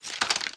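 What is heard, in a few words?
Inspect Movement; Valorant; stinger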